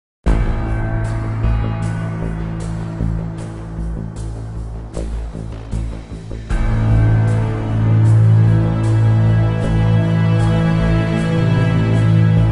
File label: music lobby